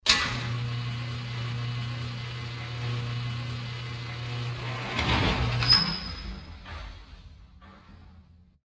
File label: bank door